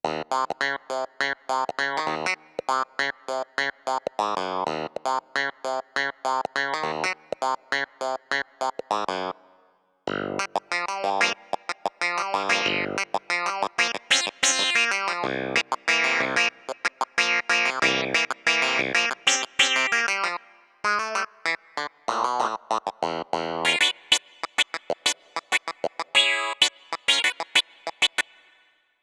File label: clavinet, Yamaha tyros3